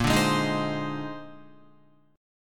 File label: A sharp 11th, Guitar; strummed; JGuitar